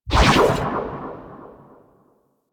Bow Unequip; Warframe; Ranger; Exalted

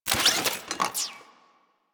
Drone Switch In; Warframe; Deimos; Cephalon Drone

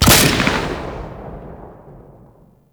Cannon Secondary Shot; Grineer

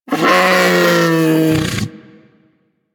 Predasite Vocal Death; Warframe; Hunting Critters

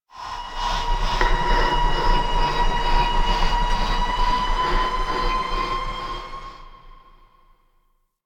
Asteroid Howls; Ambience; Warframe; Grineer Asteroid

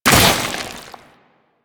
Inf Arm Cannon Mech A; Warframe; Weapons; Infested

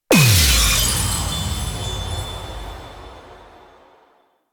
Void Tear Closed; Cephalon; Warframe; Cephalon Weave

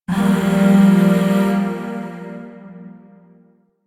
Shawzin Blues Chord F; Chords; Warframe; Zariman; Void

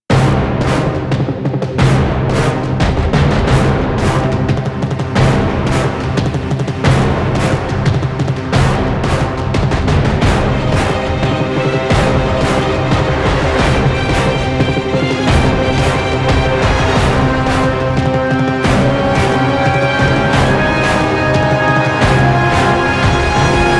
End Cinematic Music; Warframe; Venus; Railjack

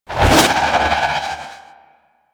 Trooper Melee Spin; Warframe; DE; Sentients

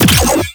Detron Power Layer C; Warframe; Weapons; Corpus; Lich

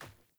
Sandals Run Dirt, Footsteps

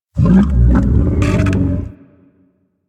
Stomach Rumble; Helminth; Idle; Warframe; Hub; Liset Alchemy Lab

